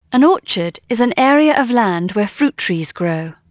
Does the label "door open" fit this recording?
No